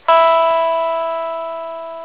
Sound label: Sound Guitar E